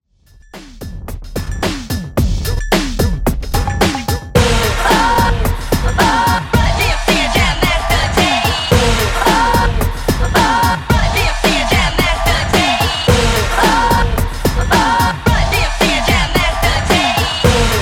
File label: Intro Breaks 110 Bpm